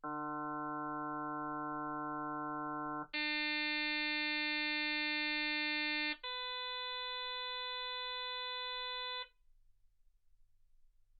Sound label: harm sinus 48kHz